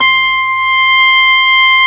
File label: ORG B3 C6; Best Service Gigapack 2